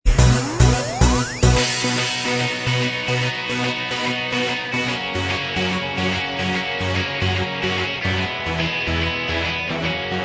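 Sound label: instrumental, backing tracks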